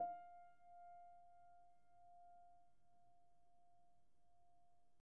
piano pp, steinway

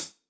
hihat closed, gretsch kit

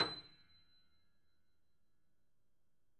piano ff, steinway

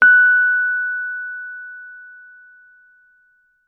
rhodes mark ii f 5, fender rhodes mark II

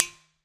Udu Slap

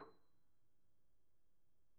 piano pp, steinway

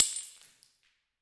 Ceramic Smash

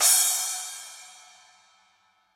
splash, gretsch kit

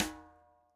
snare mishit, gretsch kit

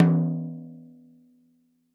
tom hi, gretsch kit

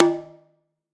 Udu Skin

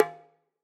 Udu Skin Pressed

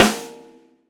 snare, gretsch kit

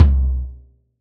bass drum, gretsch kit

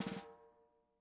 snare drag, gretsch kit

